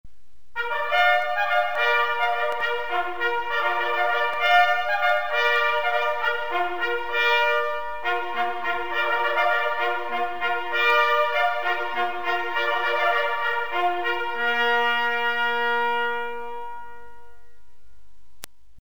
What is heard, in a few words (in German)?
wecken, signale